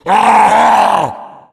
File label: tank yell, voice yell; Left 4 Dead; Source Engine